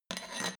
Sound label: small metal scrape bounce; industrie wirtschaft; metall